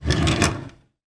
office computer desk keyboard tray slide out

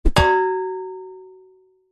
impacts swoosh into metal thud hit ring resonate

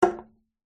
soda can down on surface

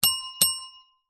bell small reception desk bell 2x rings

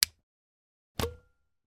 household mains plug switch off pull plug out

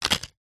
leisure playing cards several set down

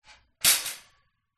shopping cart metal flap close

